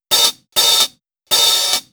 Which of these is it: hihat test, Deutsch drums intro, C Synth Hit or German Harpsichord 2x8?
hihat test